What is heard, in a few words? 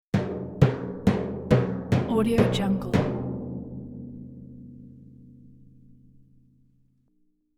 slow symphonic timpani hits; hollywoodedge